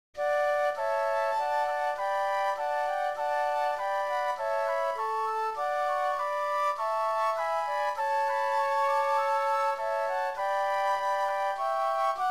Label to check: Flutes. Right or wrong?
Right